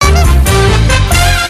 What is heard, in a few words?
roulette init; games; Billyonaire